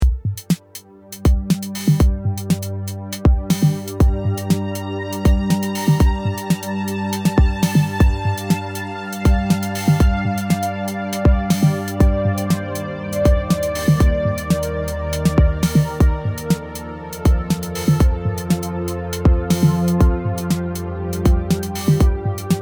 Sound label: ambient and beat